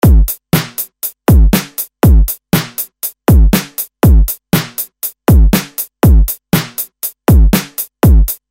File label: Beat; ShapeShifter